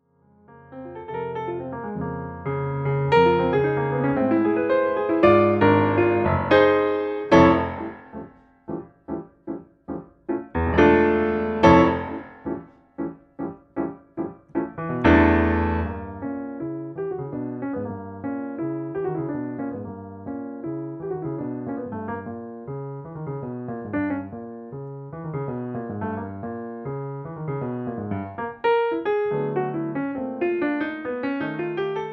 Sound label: Girly Samba